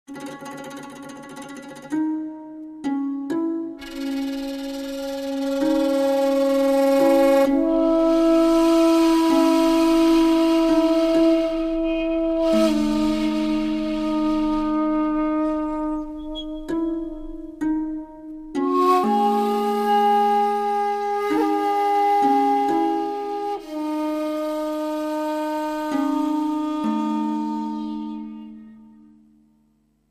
Koto

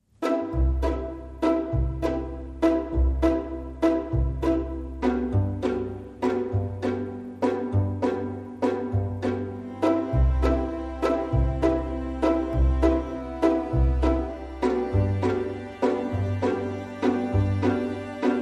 No Piano No Glockenspiel Mix

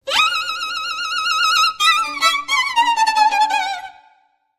Violin Gotcha

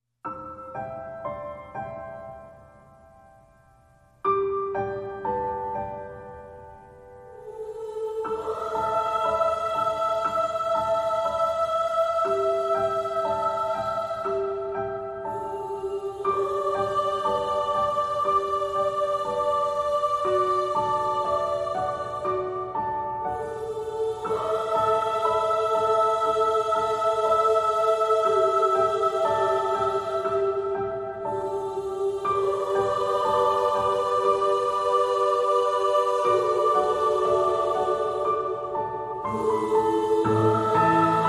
Dreamy Aura No Pads

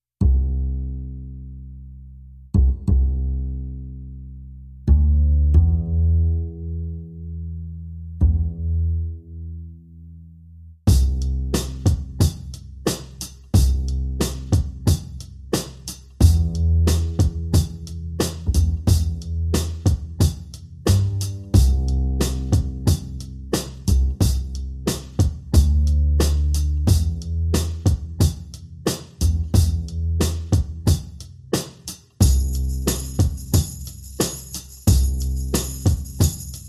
Drums and Bass Mix

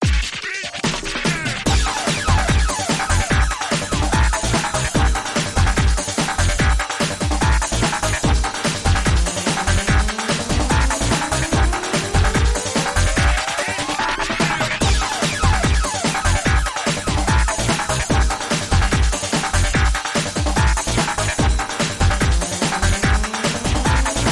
Pinball Groove

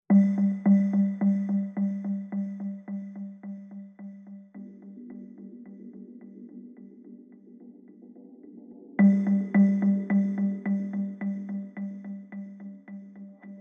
Ambient

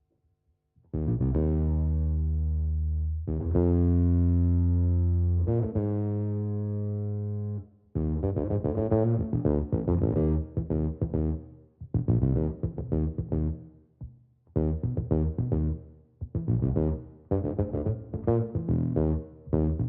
Bass Clean

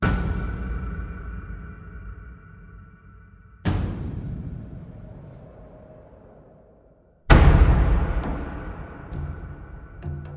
Percussion Only

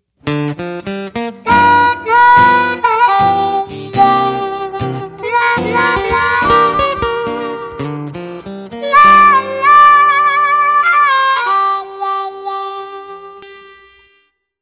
Slow Blues

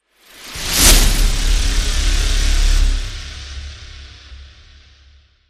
Hit Tonal Experiment

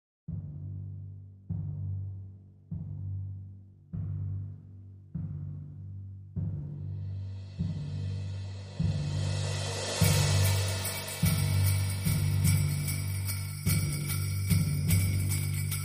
Drums Only

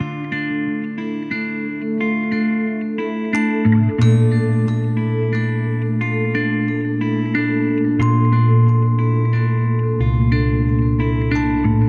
Burst Bubbles

